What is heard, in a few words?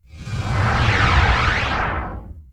Whoosh Logo Fade; Menu FX